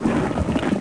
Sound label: Block Move